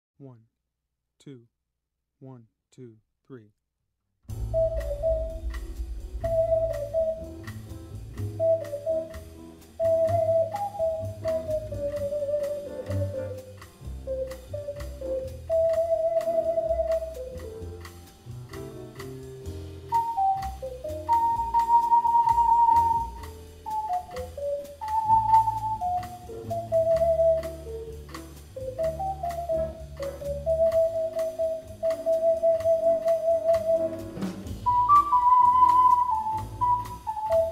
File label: bossa, easy jazz, ocarina